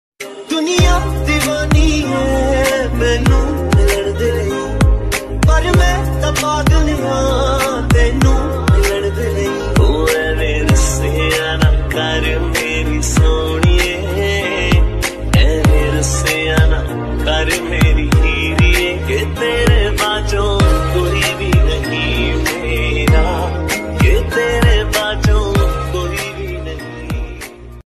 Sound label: Dj Remix panjabi ringtone